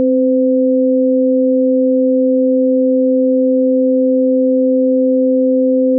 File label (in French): sine